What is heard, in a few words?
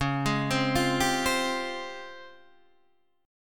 Db Major Sharp 11th, Guitar, Open D